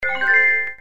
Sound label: OK Snd Low